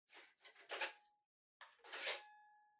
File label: Portable Stereo Far MD Eject